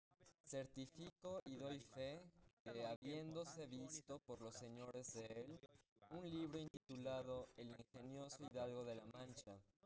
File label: male; SiSEC11; underdetermined